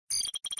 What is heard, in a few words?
input, star cores